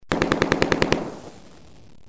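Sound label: MACHINE GUN; army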